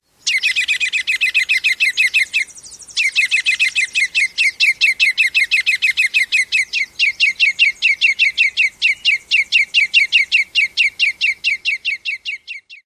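RUCRKI rapidly repeated notes, ruby-crowned kinglet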